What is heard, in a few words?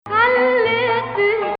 Rast Rast Octave